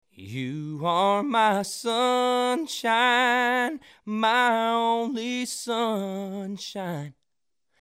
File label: vowel modification in Country